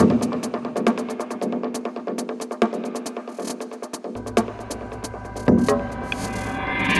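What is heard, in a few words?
percussion loop, drum n bass; KJ Sawka - Drum n Bass & Beyond; ACIDPlanet Remix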